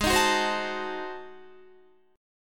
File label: Ab 7th Flat 9th Guitar, chord; Ab7b9 strummed; JGuitar